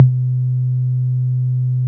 tom, Yamaha TG-500

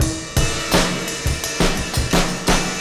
Breaks, 170; Drum&Bass; EZ Rollers

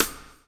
BLUES TK, Backbeat; Spectrasonics - Backbeat

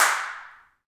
CLP HNDCL, Handclaps; Roland LCDP14 Africa VOL-2; Afr. Hands

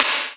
Slid, Hit; Sonic Foundry (Sony Creative Software) - Future Retro Dance Excursions

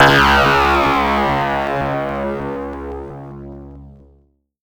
C#, Synclav FM; Big Fish Audio; SPACE1

